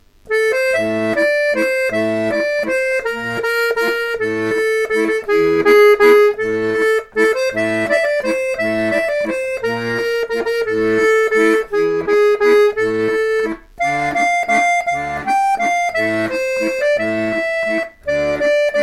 valse

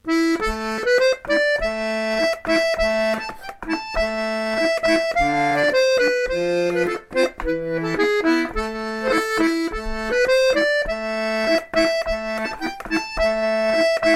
bourree

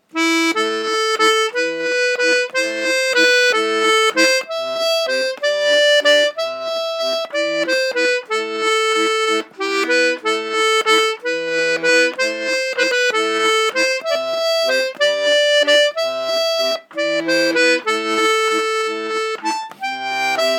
valse

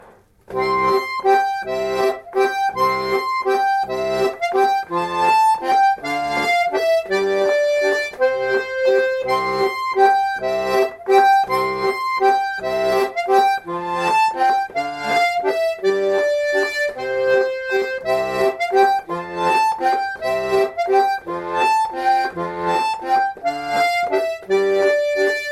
valse

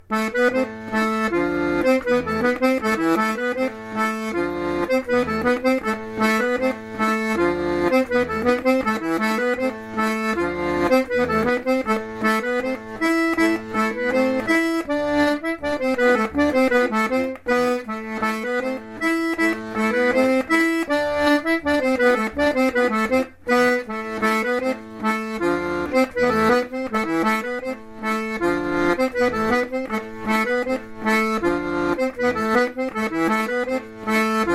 rond

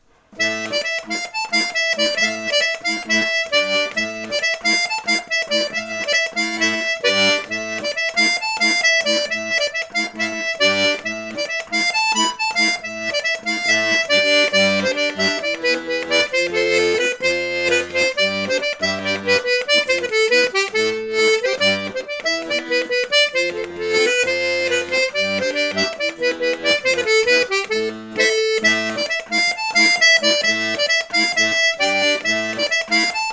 bourree de marrakesh, accordéon

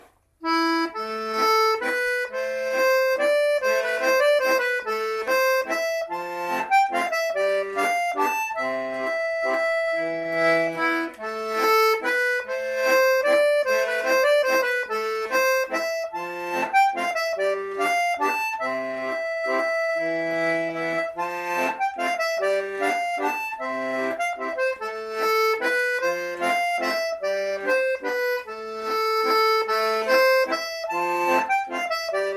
valse

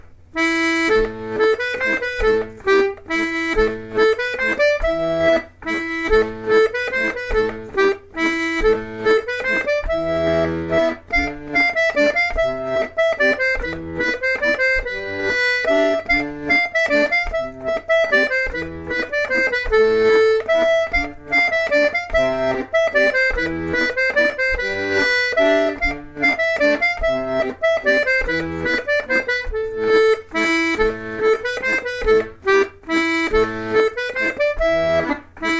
mazurka

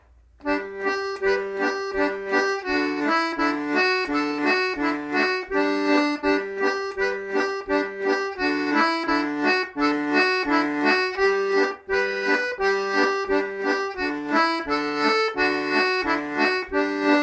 polka piquee

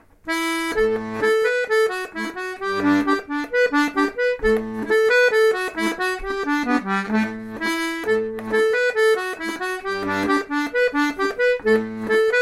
reel lent

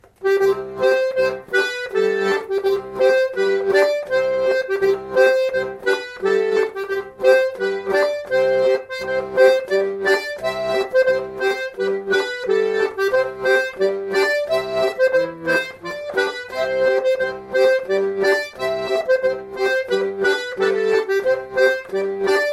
rond

